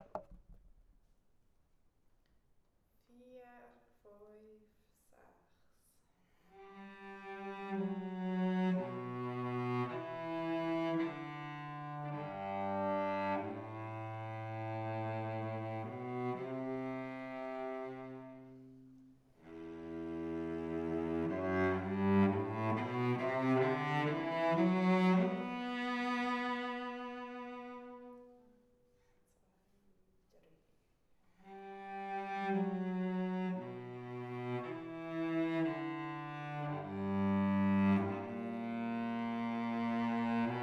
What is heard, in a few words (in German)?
cello; Der Schwan; Kinderorchester